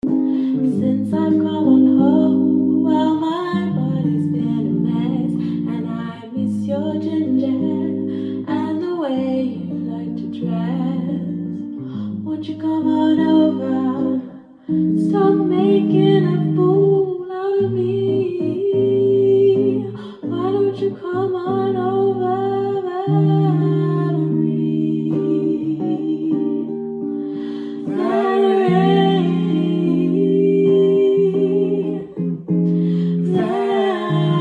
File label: strings